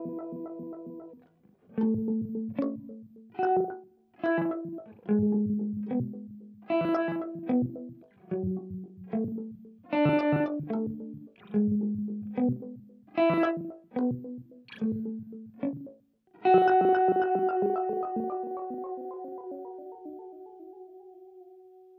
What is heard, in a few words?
Harmo Mad; Beetronics Seabee